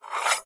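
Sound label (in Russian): четкий резкий быстрый звук заточки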